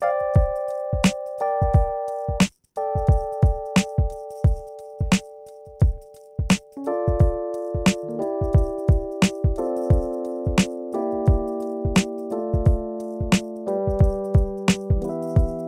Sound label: Instrumental Three